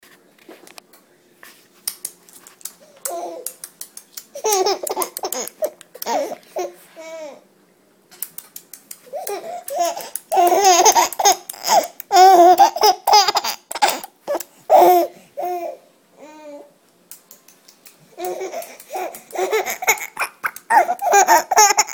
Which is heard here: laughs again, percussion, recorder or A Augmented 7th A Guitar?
laughs again